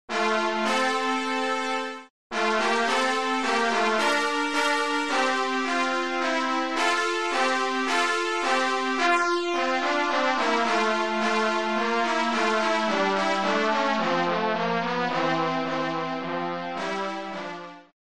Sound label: brass section ensemble